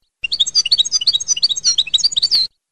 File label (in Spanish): adorno de tatabli, campero